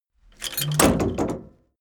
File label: tank medium hatch close